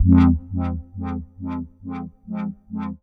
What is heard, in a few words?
stab repeat, stabs